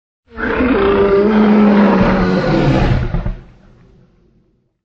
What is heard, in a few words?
slowed; JPrex